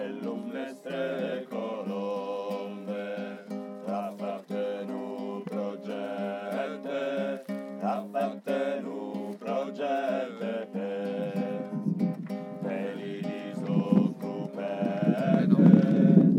basses couplet; Ganges

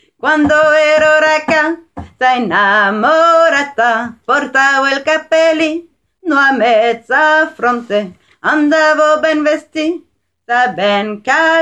Voix; Pan pentito

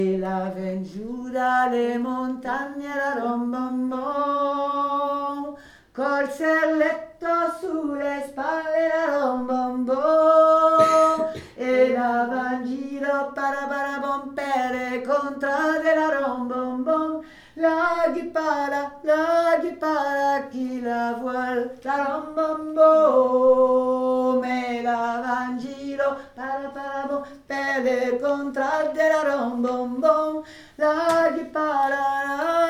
VOIX1; La Rombombo

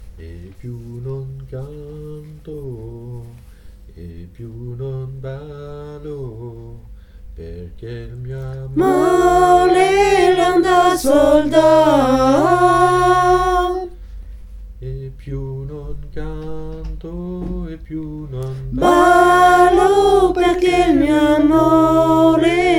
voix haute

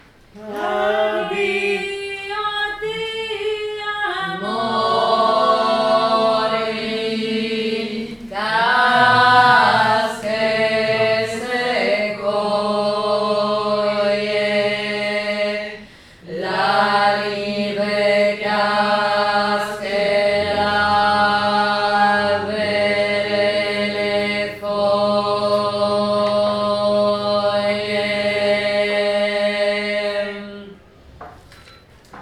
Cade l uliva ALTO; St Jean

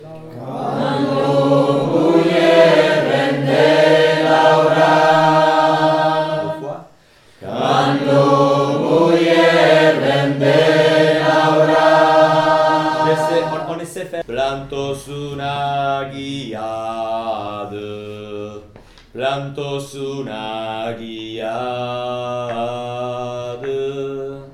voix basse; Sarrazineurs; Lo Boier

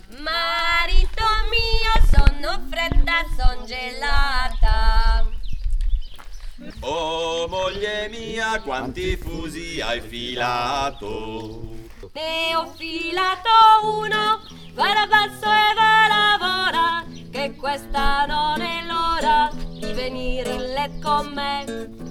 SOPRANES; Marito Mio; Pellafol

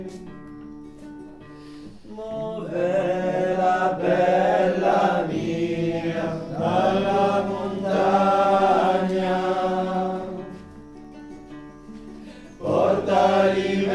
couplet bas; Sarrazineurs; Mo ve la bella mia

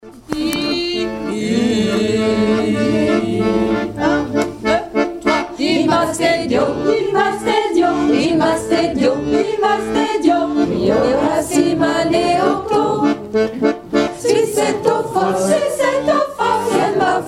alti sopranes

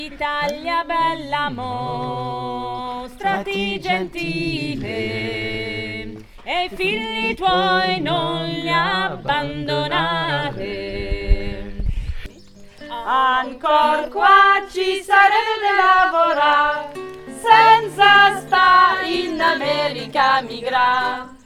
SOPRANE; Pellafol

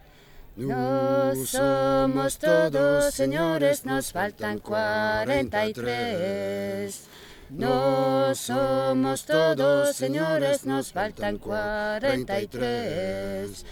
voix

